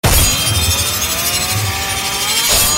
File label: chain long grind